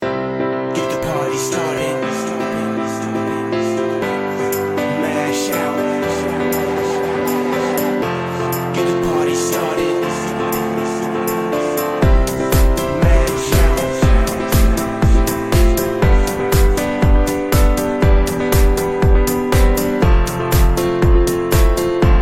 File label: dance music disco ringtone